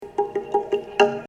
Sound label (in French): percu tuyau; residence MDQ